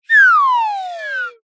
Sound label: cartoon slide whistle double pitch descend